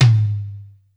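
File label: tom, Casio CTK-5000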